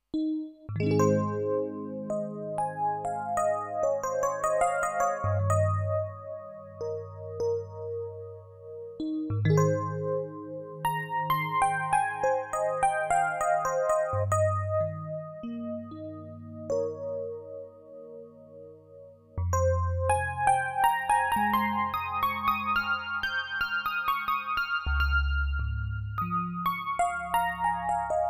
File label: Epiano